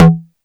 Perc; EFM

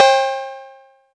Cowbell

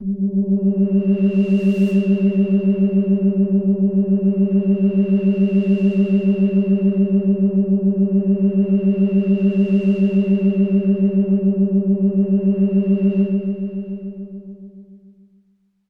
White Siren L G2; pad; Kawaii

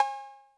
Cowbell B 808 Tape, Clean

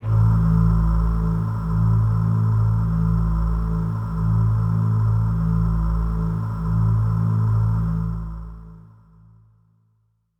Voices Kawaii A0

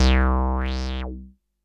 BASS

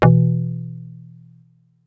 BASS; Africa bass

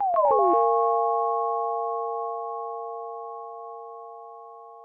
SYNTH riffz; Beautyon

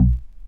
Polysix Simple Bass Vinyl Synths B0

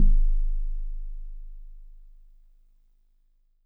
Warble Celeste DX100 G#-1

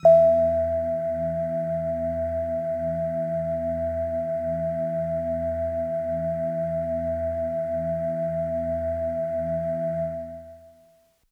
Bell Air Kawaii E2

